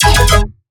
Melodic Bass E 150; One-Shots; Bass Hits (Melodic); Ghosthack; Color Bass Essentials

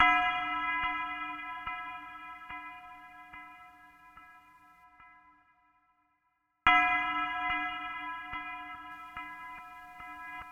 144 BPM B Min Bell, Drill; Melodies; Loop Stems; Cymatics; GHOST (Beta Pack); Break Out

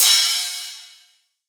Dubstep Crash; Drums; One Shots; Cymbals; Crashes

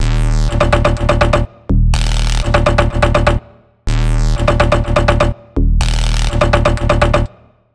G House Drop Loop 124 BPM D# Min; Synths

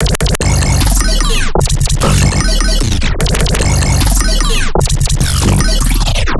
Bass Loop, Dubstep, 150bpm; X Encripted; Ghosthack; Dubstep Sound Arsenal

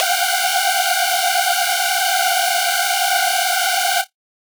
Synth Shot G Scraper; Trap and Dubstep; Synth One Shots; Ghosthack; Free Trap and Dubstep Samples 2018

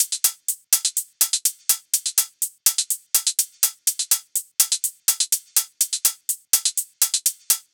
Melodic House Kit 124bpm Hihats; EDM Drums; Drum Kits; Stems; Ghosthack; Ultimate EDM Drums

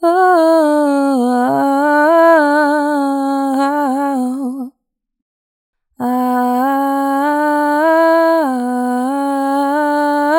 "Oooh wauoaha", Vocals, Chants

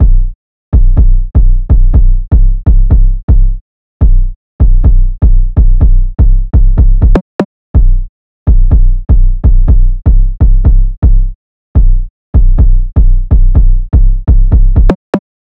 124 BPM E Min, Melody Loops; Loop Stems; Cymatics; Anubis; 808

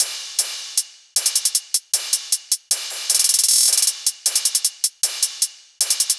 Hybrid Trap 155bpm Top; Drums; EDM Drums; Drum Kits; Stems; Ghosthack; Ultimate EDM Drums; Drum Mega Bundle; Hybrid Trap Kit 18

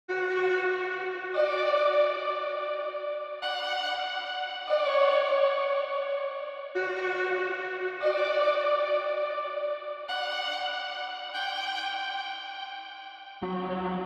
144 BPM B Min Strings, Drill; Melodies; Loop Stems; Cymatics; GHOST (Beta Pack); Break Out

